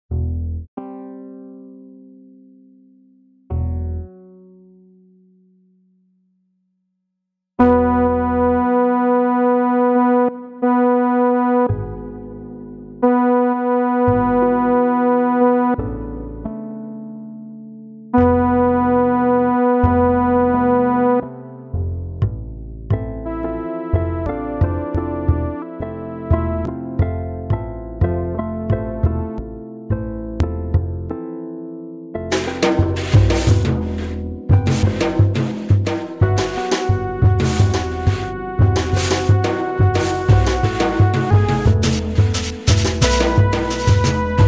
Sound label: jazzy